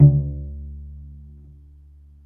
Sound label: Cello D2